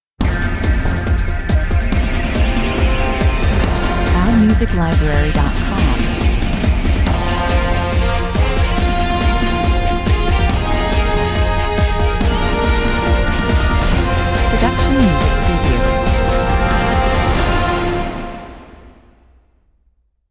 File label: action cues mission success 94 bpm